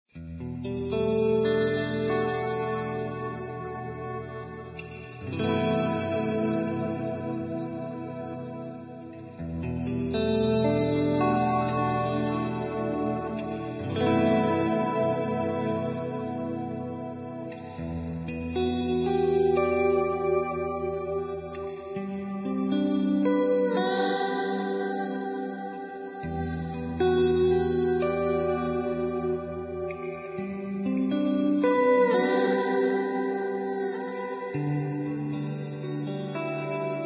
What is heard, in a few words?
guitar electric; Zomboid; Lifestyle